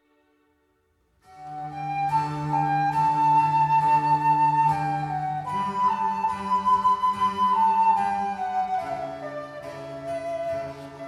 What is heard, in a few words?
basso continuo